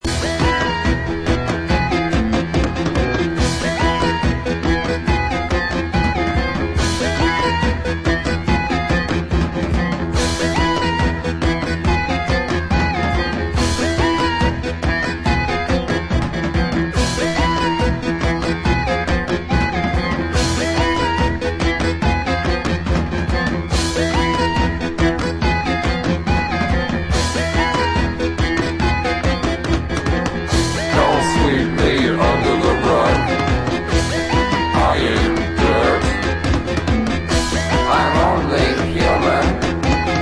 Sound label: arps carpet